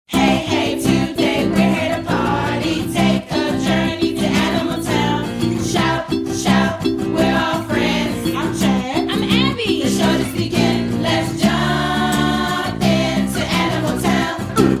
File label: Hello Song; Aniimal Town